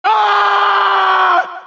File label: bloodcurdling scream